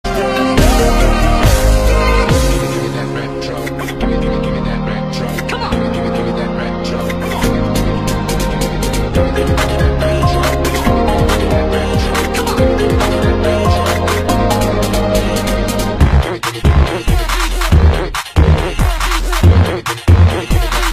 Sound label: Iphone Remix